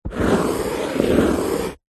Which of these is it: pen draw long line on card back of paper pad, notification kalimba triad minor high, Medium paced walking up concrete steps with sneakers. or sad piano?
pen draw long line on card back of paper pad